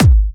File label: Kick 4/4 140BPM; Drums & Perc; Vengeance Samples; Selection Kit; E minor